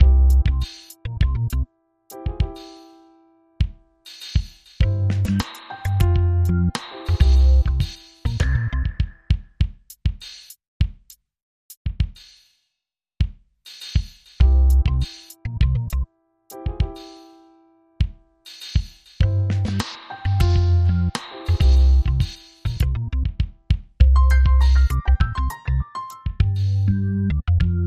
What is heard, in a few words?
Latin Tec